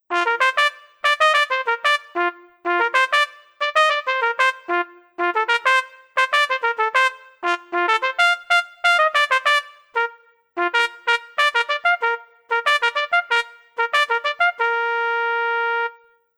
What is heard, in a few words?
trumpet